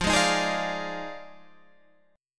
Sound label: F Minor 13th, Guitar Open G